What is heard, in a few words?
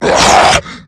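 infection attack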